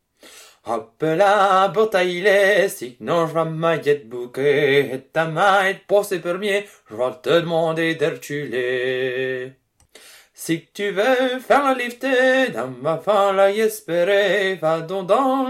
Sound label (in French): Chant